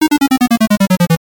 arcade game fall tone

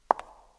step; TV Show Soundboard

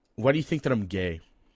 am i gay, dying laugh; Voicemod

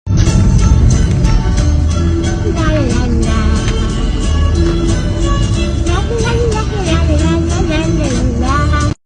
grandma singing in car la la la; granny singing in car

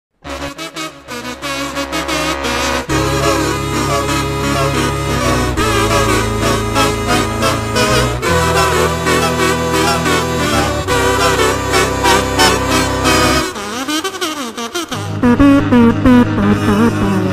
kazoo cover

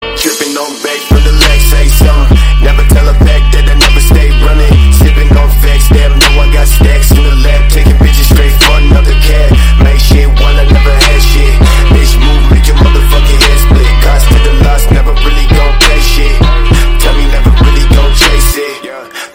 trap bass; deira city centre

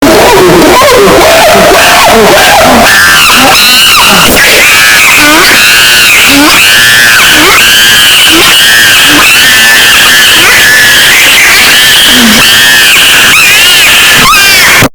monkey sound effect earrape; angry monke

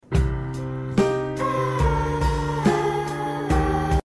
sad music

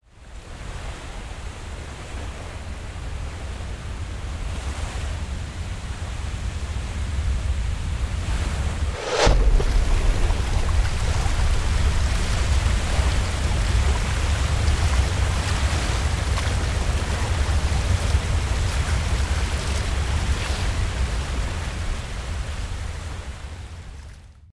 cinematic tsunami sound; tsunmi

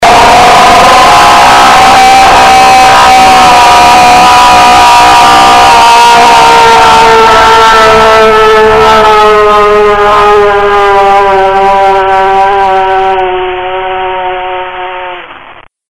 scream earrape; golden freddy

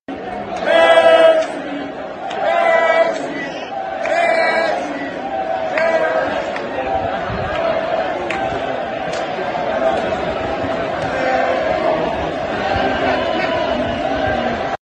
chanting messi messi

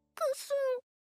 snif; junko hokaze

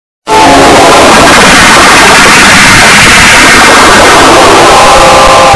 Trumpet Sound; The Boiled One Phenomenon

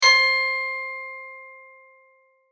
bell C

